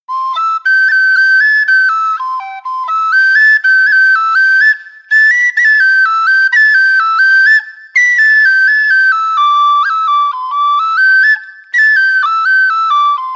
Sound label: hornpipe; birds hornpipe; 400 irish tunes